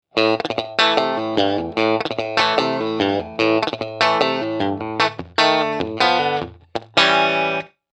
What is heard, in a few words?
Tiny Tweed